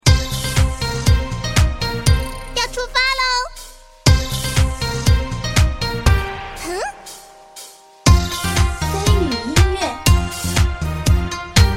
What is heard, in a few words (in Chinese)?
动感-欢乐-活力